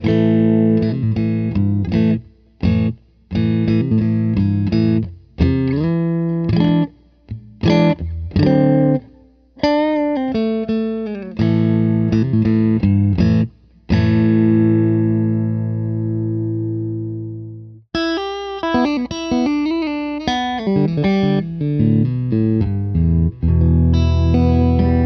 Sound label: middle clean, pickups